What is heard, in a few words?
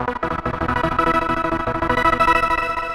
synth